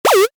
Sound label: Retro SFX, Retro Style, Harsh, Heavy; Tech (Sound Effects:Science Fiction)